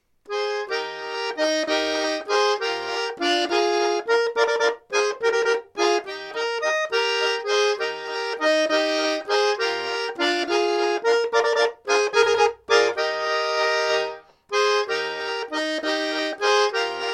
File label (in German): koebeli walzer